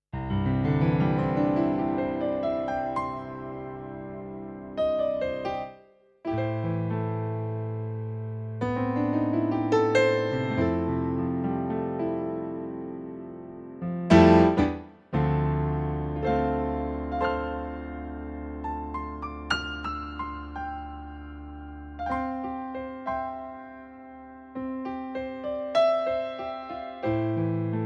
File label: Piano; MOX6